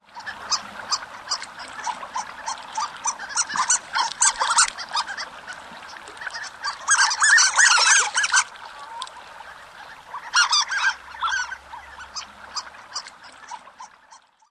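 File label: typical calls, harlequin duck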